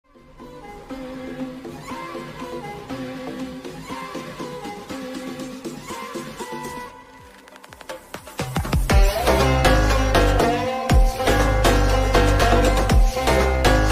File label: Music Ringtone